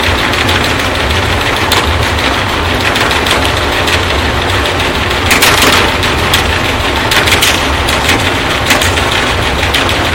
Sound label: container ships departing; vessels; anthrophony